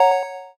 inner voice

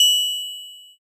glocken

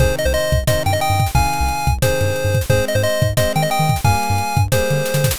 bgm

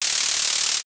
shuffle

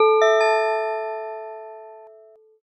gamelan

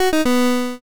gacha lose

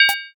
equip

